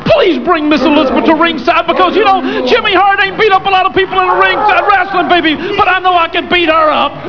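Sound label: heartbeat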